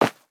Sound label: Rattle Snake; Rabbids Multiverse